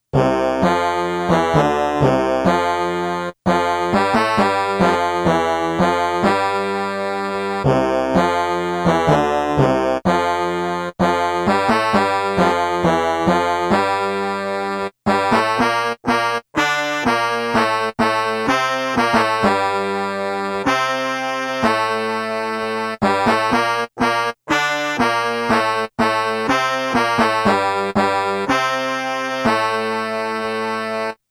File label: melody